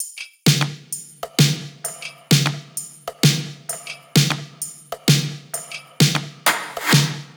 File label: top loop, Drums, Loops, Top Loops, Pop; woodlyn 130; Slate; SD PASADENA Pop Sample Pack